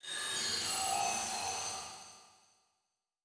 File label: Title Transition Crystal Shatter; Production Element Title Transition